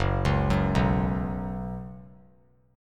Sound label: F sharp Suspended 4th; arpeggiated; F#sus4